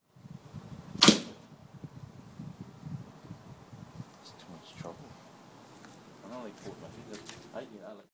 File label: Bow and arrow